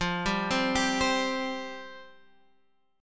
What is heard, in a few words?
F Suspended 2nd Guitar Drop D, chord; x,8,5,5,8,8